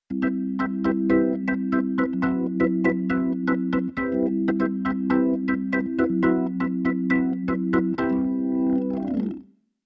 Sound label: Keyboard Organ Bossa Organ